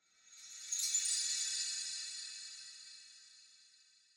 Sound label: shine, crimson forest, nether, ambient; minecraft